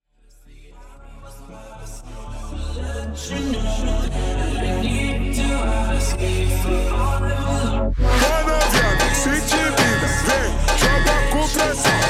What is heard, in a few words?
Funk slowed